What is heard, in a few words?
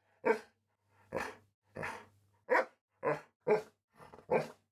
Dog Bark Grunt